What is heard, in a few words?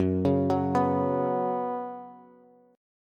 Gb Suspended 4th Gb, down, Bass; arpeggiated; JGuitar